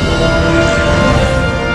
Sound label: Cell Ship Fly By; trek